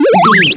retro powerup item

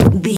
footstep wood land

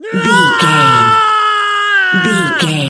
voice female battle shout

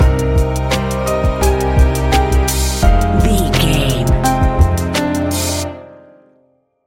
A relaxed and chilling piece of music

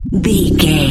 retro misc bass sound

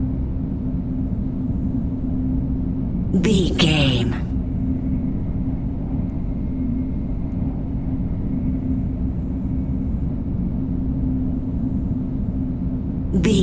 sci-fi spaceship background room tone hum loop

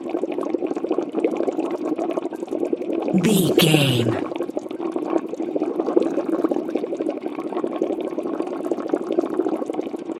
water bubbling loop

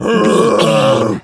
gorilla growl

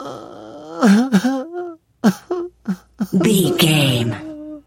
voice male b cry whimper high

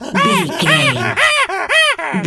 monkey chatter angry

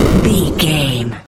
retro explosion general

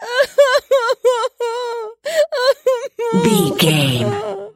voice female cry

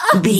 voice female hurt pain high

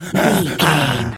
gorilla angry

